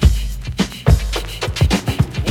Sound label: DOWN BEET LP, breakbeats